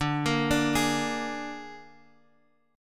Ab Suspended 2nd Flat 5th D, chord

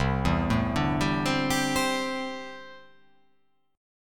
C 6th Add 9th C down

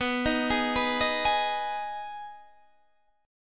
Ab Minor Cb; arpeggiated; Abm/Cb Chord

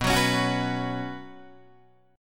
B Suspended 2nd, Guitar, DADGAD

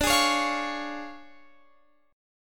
D Minor Major 7th Flat 5th, D, Guitar Open F; DmM7b5; strummed

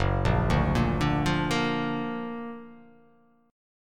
Gb Augmented Major 7th Gb down; Gb+M7 arpeggiated

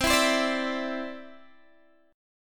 C-Major Add 9th, Guitar DADGAD

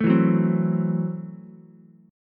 F sharp Minor 7th Flat 5th F sharp Ukulele; strummed; JGuitar

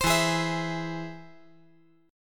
E Augmented

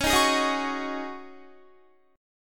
Db Suspended 2nd Suspended 4th Db Guitar; strummed

